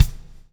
lash, backbeat

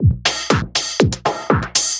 Filtered Beat, Trance; Sonic Foundry (Sony Creative Software); Trance NRG VOL-2; Drums

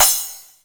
Open hat, retro house samples, Drum Hits

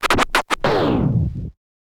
scratch kit, vinyl

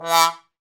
BRS BONEWA, Solo T.Bone; Roland L-CD702

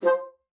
bassoon, C5; Xsample VOL-6

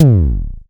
Kick, Lel UDS

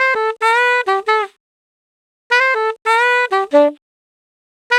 Sax 100 bpm G, Saxaphone; Quazibeat; African Secret

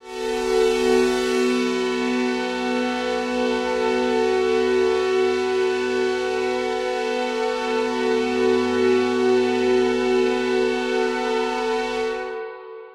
One Shot Drone B, dystopian drone; One-Shot Drones

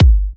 G, kicks; markus hakala kicks

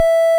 Beep; Bitkits; Blip & Blop

